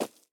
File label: dirt, walking; factorio 2.0.55